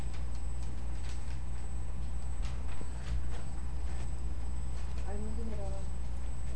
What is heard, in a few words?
signal